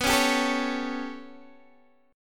B 7th Suspended 2nd Sharp 5th down Guitar Open D, chord